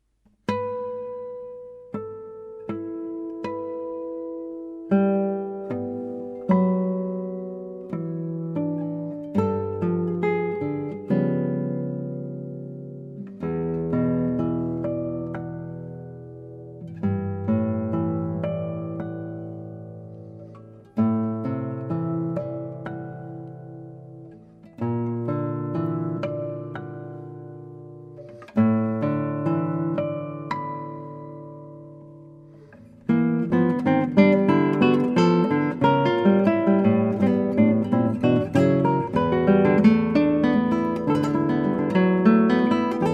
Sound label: Panoramic Guitar; Oeuvres pour Guitare